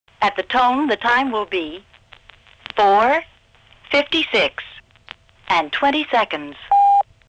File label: time signal